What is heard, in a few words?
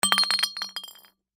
Bottle Fall Drop, Bottle Fall Drop No Break Impact; Drops (Sound Effects:Objects); Foley; Blastwave